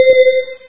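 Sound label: elevator bell; video game; Source Engine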